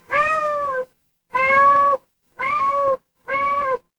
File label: animal cat meow